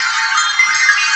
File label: BELTREE, BELLS; CMI Sound Library Rev 1.3